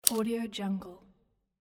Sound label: typewriter single key; domestic sounds